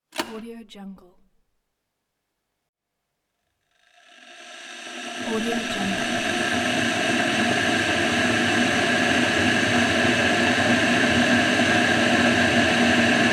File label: kettle; ponyrai